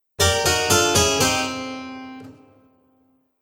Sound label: Fail Harpsichord; Musical